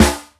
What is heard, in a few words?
carmi, snares real